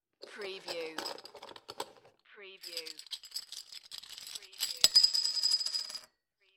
Beer Bottle Tops; beanstalk audio

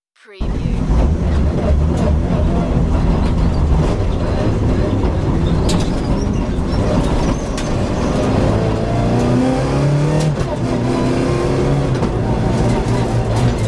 Rally car race excerpt; beanstalk audio

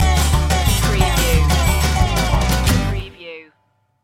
hickabilly hoedown stinger

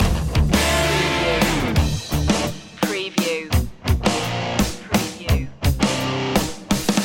8bit rocktronic loop

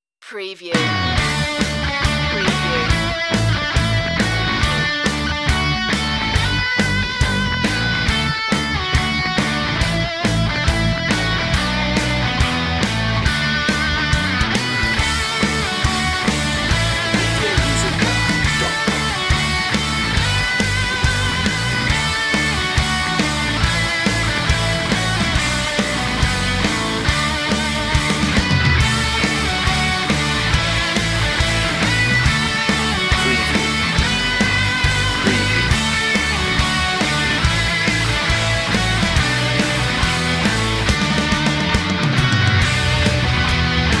auld lang syne

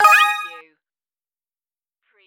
musical

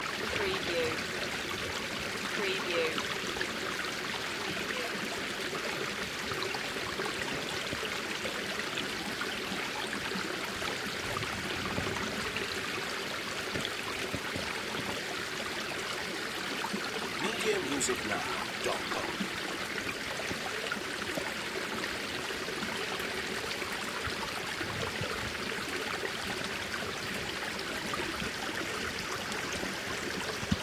Gentle River Loop

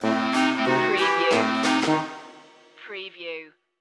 dewdropper stinger

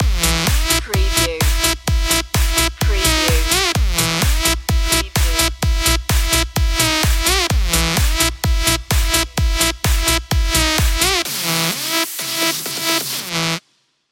club dutch

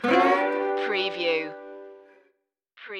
happy western stinger

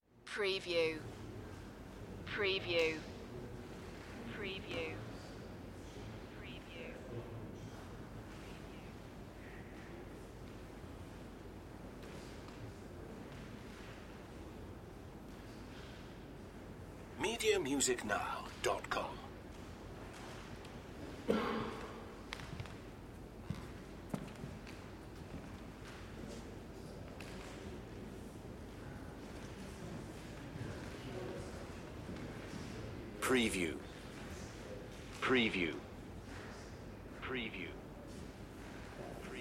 France Museum Crowd

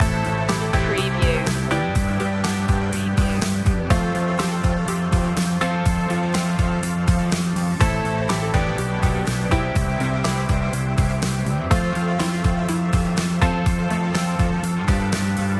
brand new day loop

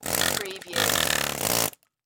Air Hammer Operate; sound effects bible

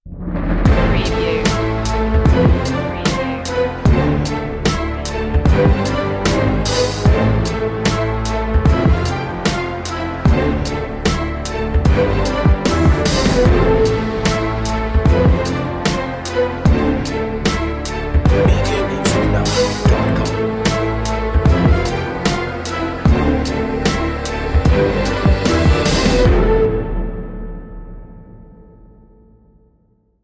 battlesport no choir

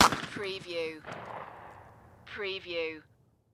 Shotgun Krieghoff; sound effects bible

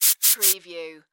Compressed Air Spray

